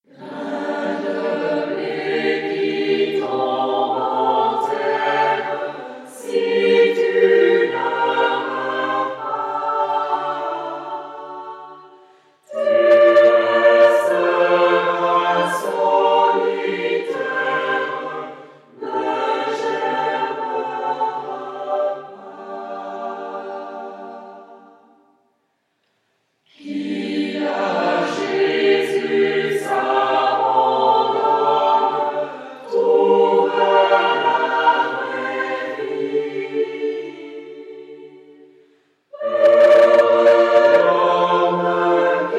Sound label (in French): chant, en choeur; grain de ble; enregistrement du chant par la chorale Menno Canto; recueil ALLELUIA n° 55-07; Communauté du Chemin Neuf